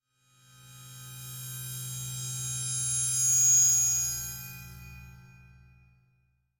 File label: Cymbal Bowed Metallic Tone Sharp High Scary Element; Hollywood Edge; sound designer's toolkit v2